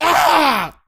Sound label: pain; svencoop; st allies; crimson commando